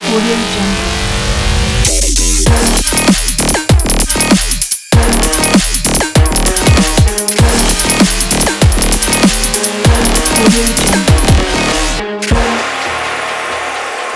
music logo

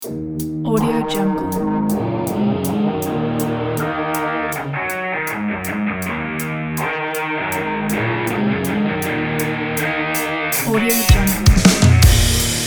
sport rock

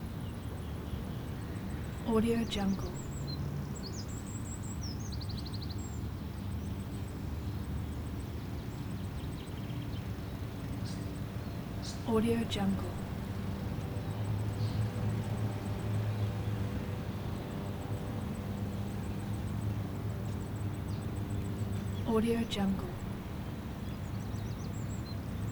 suburban ambience

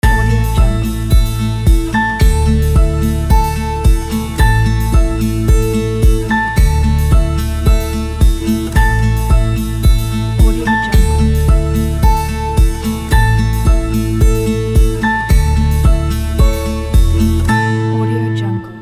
acoustic guitar corporate simple logo

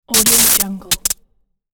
electric sparks shorting; hollywood edge

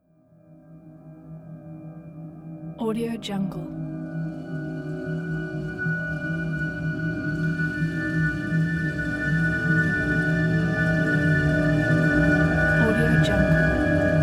ominous suspenseful ambience

